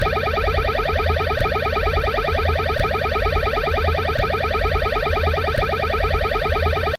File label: concorde overspeed